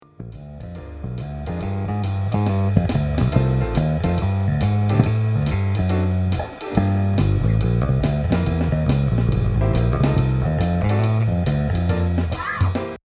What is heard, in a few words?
blues